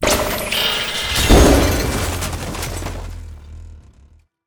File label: crane break snap; American Fugitive